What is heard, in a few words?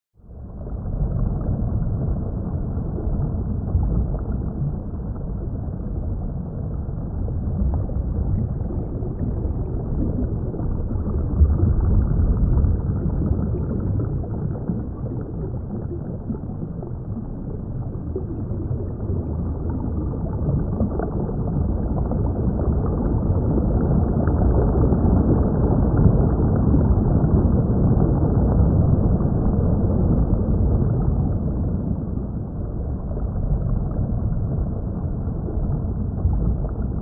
Under water